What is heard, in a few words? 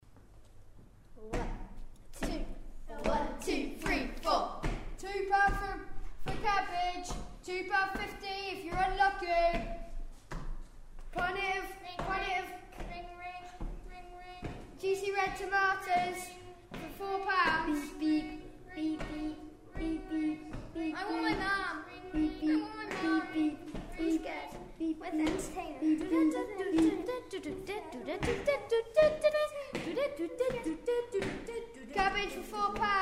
Footstep